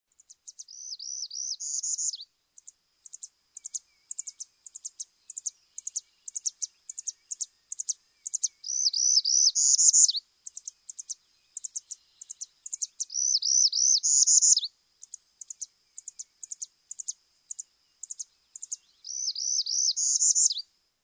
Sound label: Northern Parula